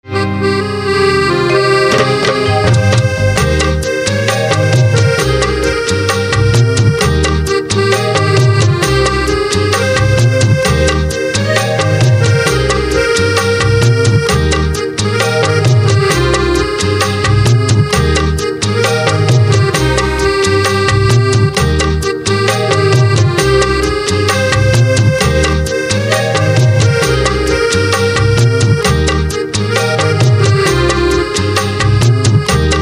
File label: Accordion